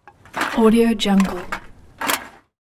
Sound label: throw aluminum cans